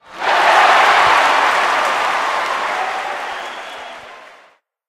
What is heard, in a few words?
Special Audience Cheer Stereo